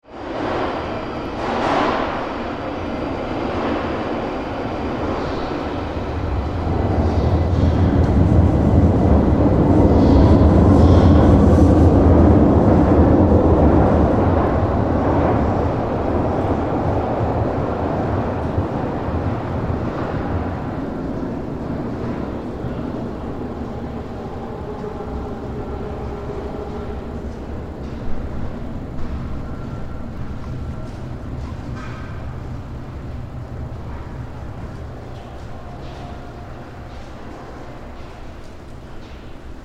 London Underground, Atmospheric ambiance of London Underground tube station; Train Stations